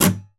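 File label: strummed; E-MU Producer Series Vol. 8 – Platinum Phatt; Best of Phatt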